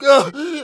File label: hit, pain; mohaa